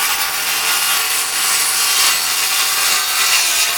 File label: loaded circular saw